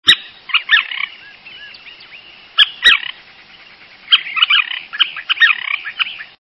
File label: Scissor tailed Flycatcher, songs